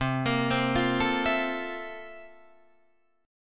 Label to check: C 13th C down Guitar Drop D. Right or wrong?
Right